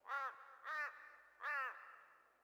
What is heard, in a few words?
sting crow; Rust